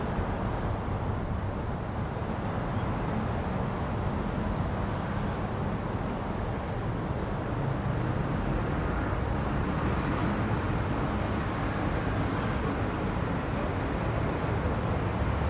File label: lake bbq chopped; Coburg Lake 2026